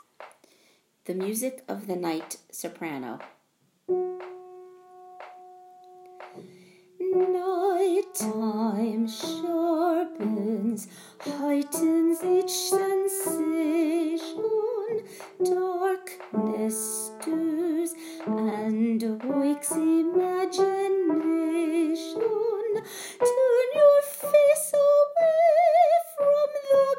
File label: Music Sop; Broadway Shabbat; Soprano